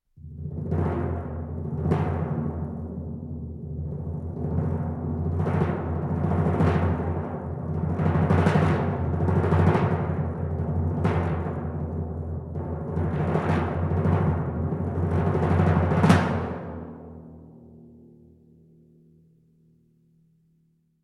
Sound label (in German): Drums Percussion Donnerwirbel